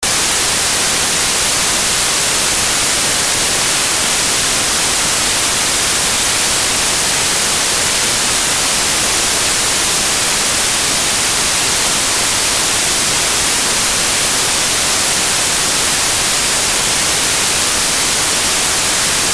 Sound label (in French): bruit rose